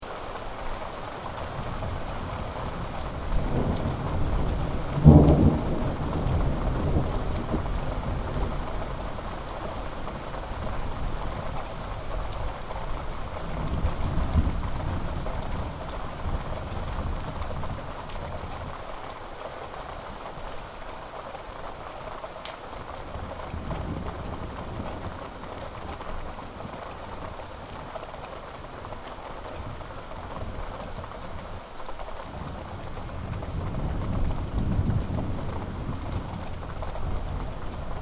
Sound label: Ambience Sannohe Summer Thunder Storm, Storms in Sannohe Japan; Outdoor Sound Effects; Outdoors (Sound Effects:Backgrounds); Foundation1